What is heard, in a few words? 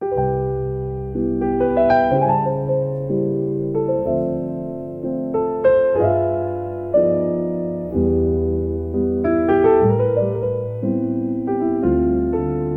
Jazz